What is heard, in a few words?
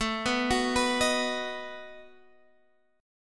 B Major A